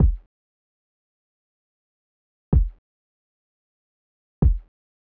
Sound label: kick off